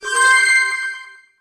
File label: goal ring